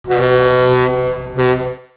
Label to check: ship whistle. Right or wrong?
Right